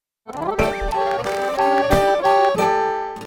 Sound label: Dadgad; Sony; Xperia Movie Creator Music